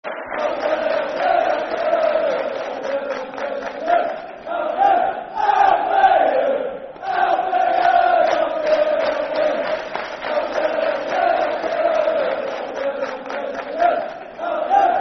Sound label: Albion, Albion, Albion Football Chant (Soccer Song), Brighton; Brighton Bhafc